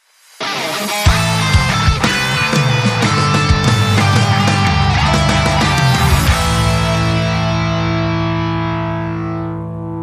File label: Hard Rock Strut